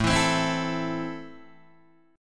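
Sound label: A Minor, Guitar DADGAD, chord strum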